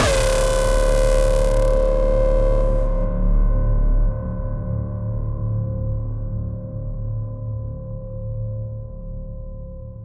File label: Alarm Hit